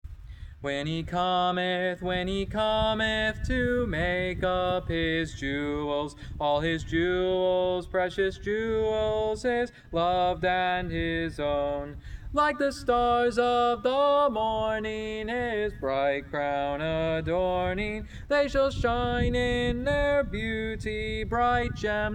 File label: When He Cometh, piano playing